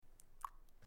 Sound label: fill